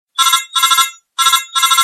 Codec Ringtone; Mgs3

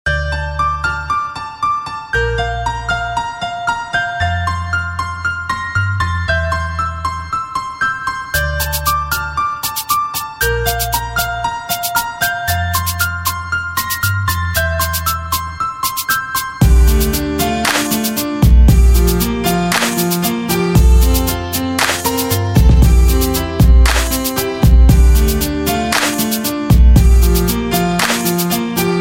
Very Nice Beat

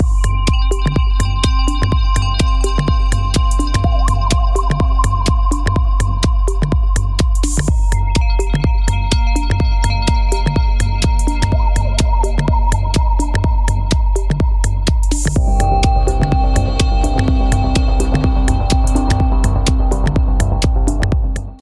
Nice Chillout

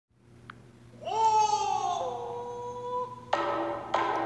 Kabuki Yell

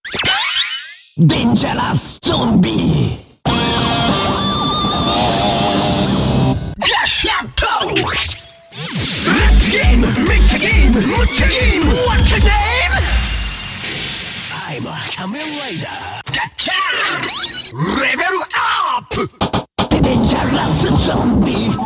Dangerous Zombie

